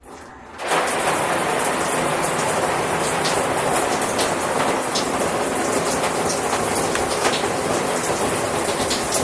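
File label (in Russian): zvuk dusha, shower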